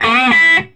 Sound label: SOLO E, Vintage Blues Guitar; USB Soundscan vol.22; AKAI; SOLO E125